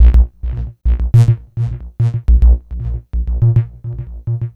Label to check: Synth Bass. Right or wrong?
Right